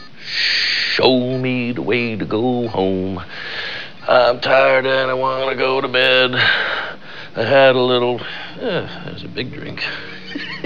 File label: big drink